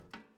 metal jump

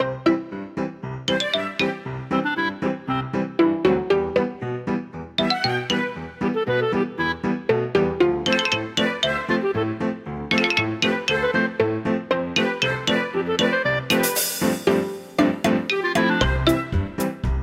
Bgm Game Music; Farm Mania

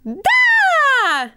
Emote Cheer; the princess and the frog; Twitch Skijump

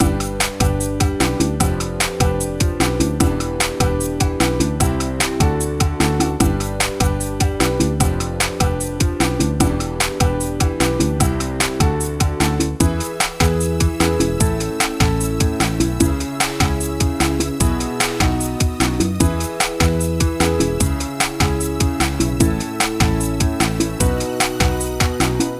Ingame Cinematic

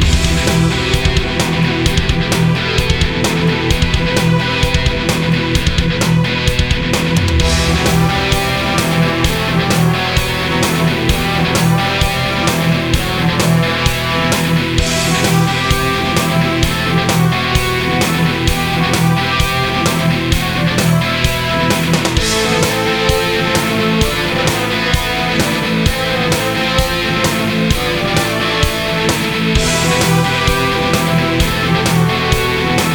BGM Airship Stage Loop A; Fallen Knight

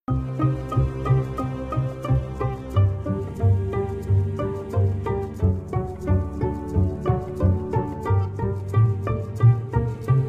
Pizzicato; World Mosaics 6

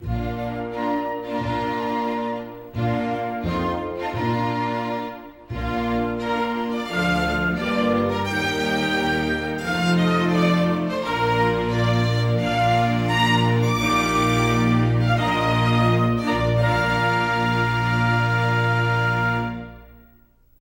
sting wedding; The Sims 3; windows gamerip 2009

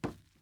wood step

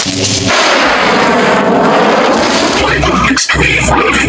sfx intro; Playman Extreme Running

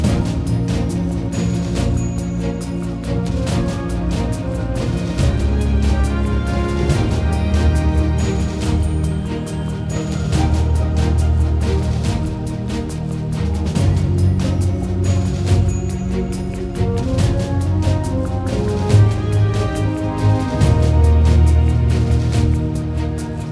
Menu Main LOOP

